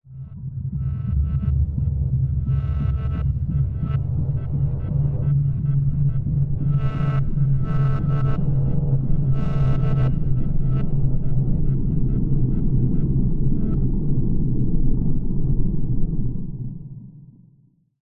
science fiction space transmission weirld rumble glitchy; Audio Mangler